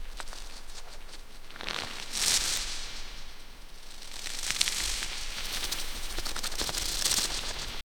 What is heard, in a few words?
scratching of reed on pap